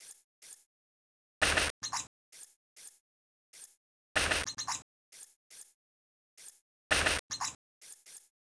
Spider Idle

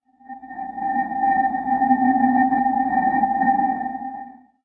Mage Book Idle; Seer; GamigoNA

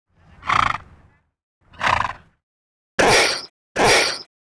Deer Sled Action; Seer; GamigoNA

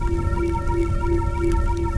stone fire; Seer; GamigoNA

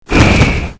Chimera Skill

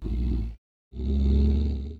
Goblin Idle

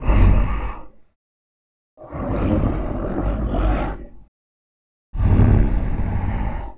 Triceratops Idle

